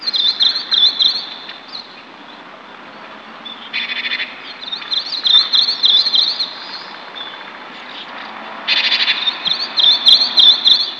birds; Animals

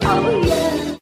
"oh yeah" sung

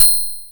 Triangle; Misc Percussion

